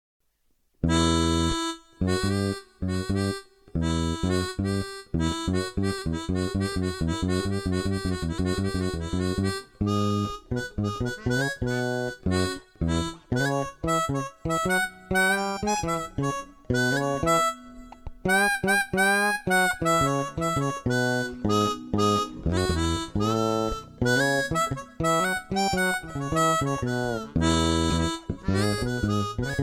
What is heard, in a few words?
gr-20 2ch harmonica, bass